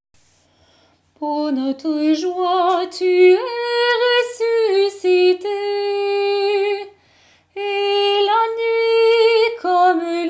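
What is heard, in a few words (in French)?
Psaume pour notre joie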